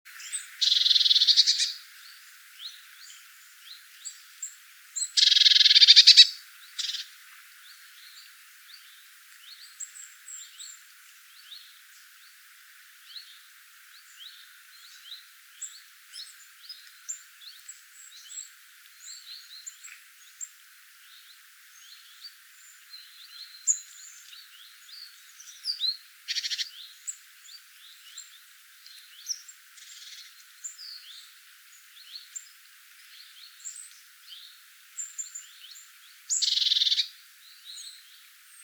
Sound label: Cyanistes caeruleus; SETE